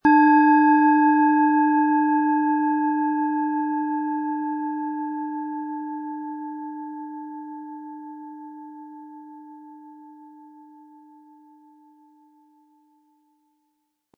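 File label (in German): Planetenschale Eros inkl. Klöppel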